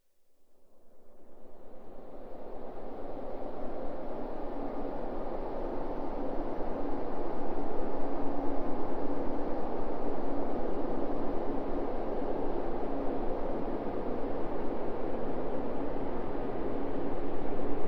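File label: science fiction planet atmosphere wind desolate barren desert; zapsplat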